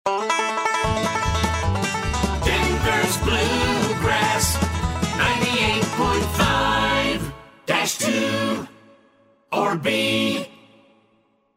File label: Radio Layered Jingle